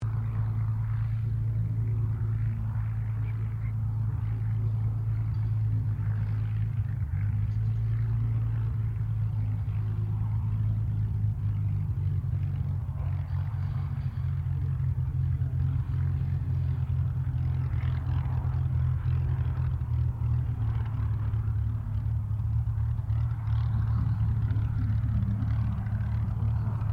AIRCRAFT SUPERMARINE SPITFIRE MK-XVI TAXI SHUTDOWN; Airplanes; Vehicles; Aircraft Collection